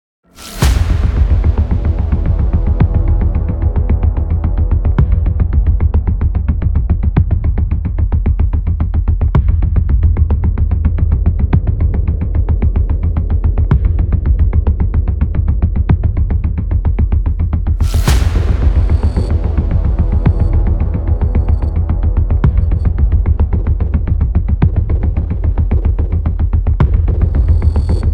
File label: action trailer; starmusicproduction